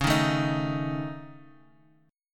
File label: Db Suspended 2nd Sharp 5th, Guitar, Drop D